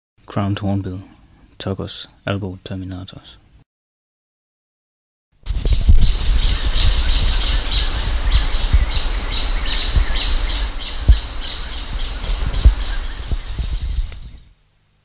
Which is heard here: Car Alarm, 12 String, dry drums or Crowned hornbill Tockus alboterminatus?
Crowned hornbill Tockus alboterminatus